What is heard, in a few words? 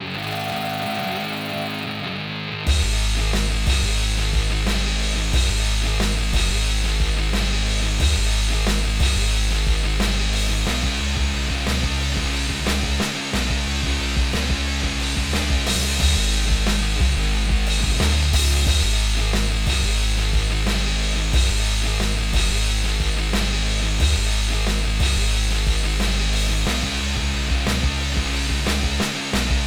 Toxic Generator (Main Level Song); Stage4